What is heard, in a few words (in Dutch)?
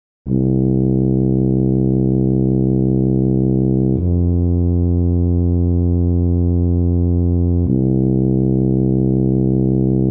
Tuba in Eb